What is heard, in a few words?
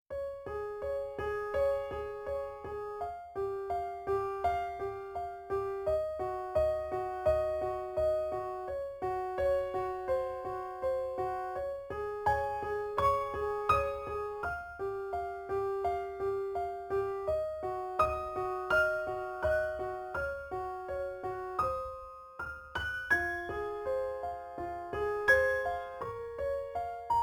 piano; Steven Universe Soundtrack